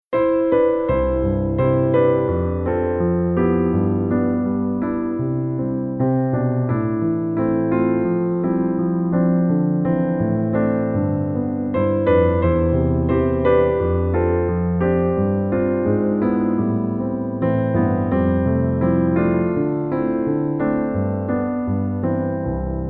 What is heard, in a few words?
hymn